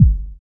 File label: DR. LOOP, DRUMS; X-Static Goldmine 3